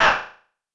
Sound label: pain; Alien Arena; firebrand